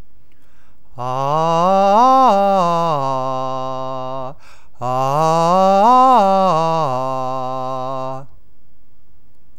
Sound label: arp ah3